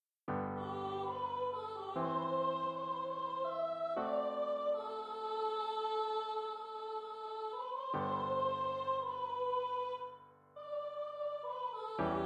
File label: CHRISTUS RECITATIF